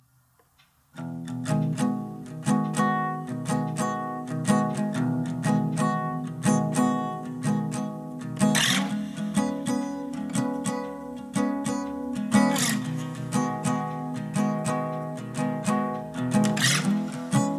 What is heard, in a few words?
Waltz; official soundtrack of Elsewhere